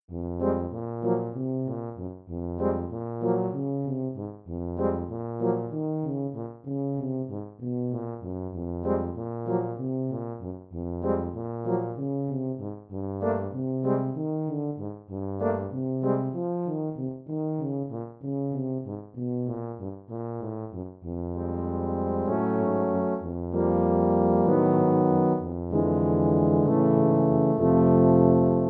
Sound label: Tuba Suite Dance